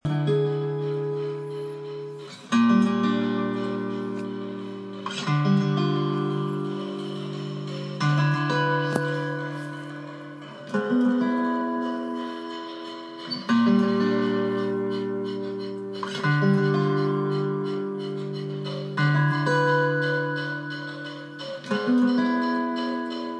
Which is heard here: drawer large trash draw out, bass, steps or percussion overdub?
percussion overdub